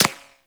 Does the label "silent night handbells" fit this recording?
No